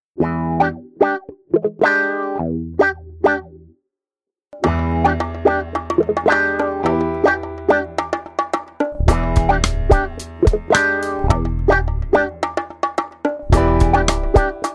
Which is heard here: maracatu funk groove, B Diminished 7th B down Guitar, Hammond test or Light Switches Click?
maracatu funk groove